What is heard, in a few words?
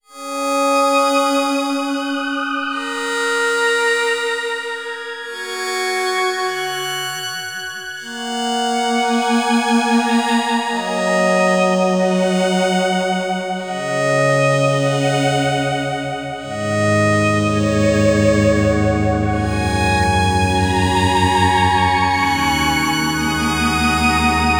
violins